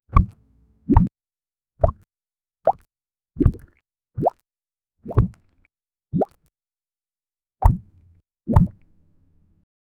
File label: bounce